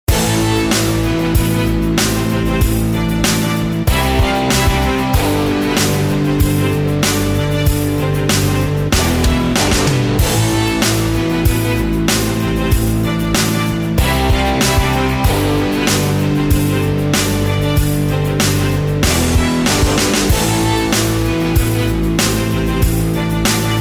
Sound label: (Am) No Backing Vocals